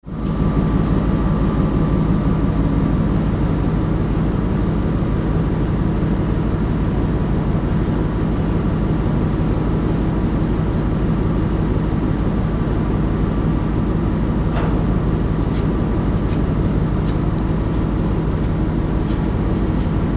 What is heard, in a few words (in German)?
Faehrfahrt, Radreise Skandinavien; Gudvangen Flam; Radreise Skandinavien 08 2016